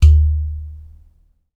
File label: UDU, African